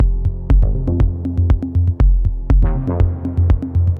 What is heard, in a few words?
boss loop